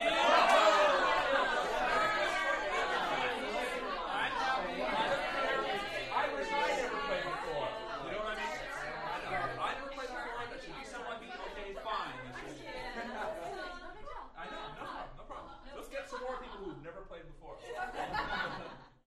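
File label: Crowd 15 Interior Rowdy Yells; small int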